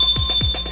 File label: sprint ding